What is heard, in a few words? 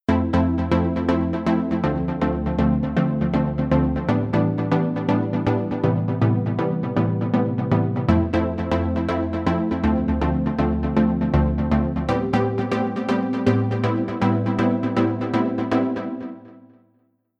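Synth Pluck Chord Top Melody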